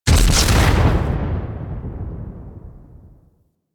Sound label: Rocket Launcher Explosion; Warframe; UT Weapons